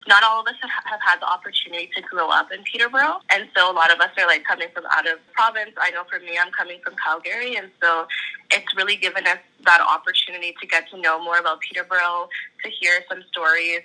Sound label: choir